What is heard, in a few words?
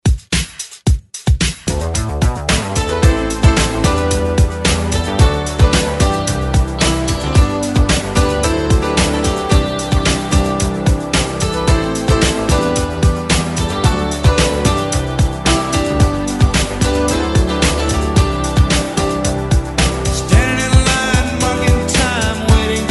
ReDrum 111Bpm